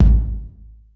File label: GATED K909; Masterbits - TR-Minator